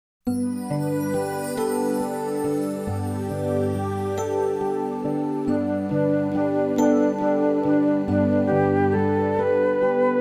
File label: Jesus Loves Even Me instrumental